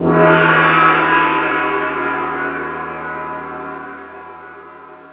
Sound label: gong